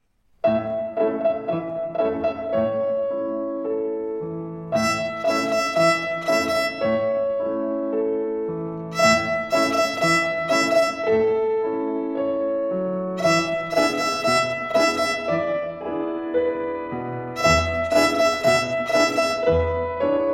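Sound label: Rhythm on E Third Twinkle Rhythm, violin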